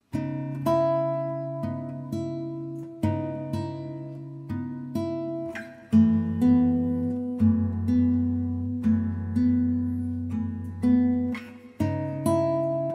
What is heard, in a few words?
Peaceful Guitar